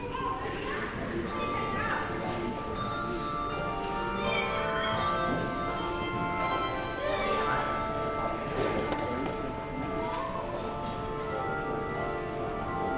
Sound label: Handbells; Lord Keep Us Steadfast in Your Word